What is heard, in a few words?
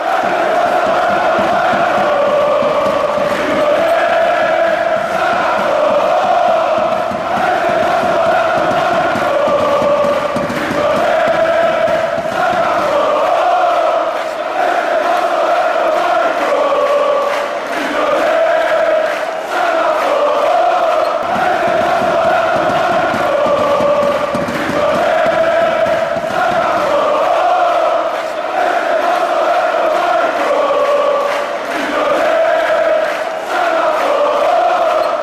olimpiakos chant